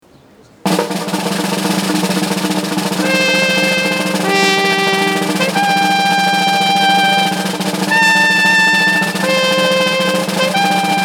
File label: fanfare live